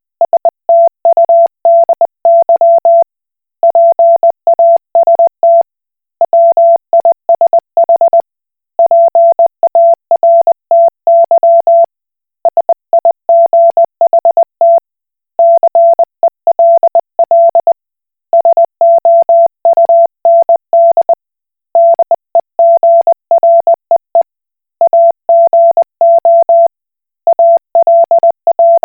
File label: Koch Morse